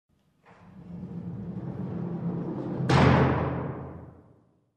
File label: Warehouse Sliding Doors close